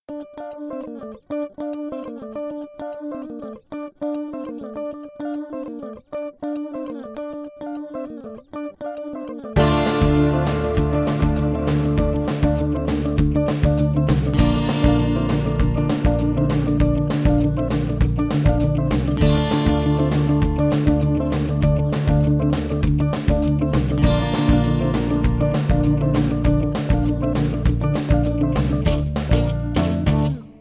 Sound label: Guitars Ending